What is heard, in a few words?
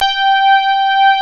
HARD RHODS G4; East West; Global Sounds; D50